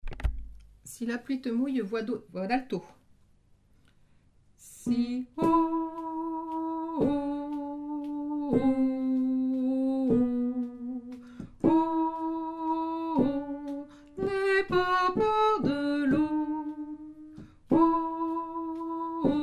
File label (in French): si la pluie alto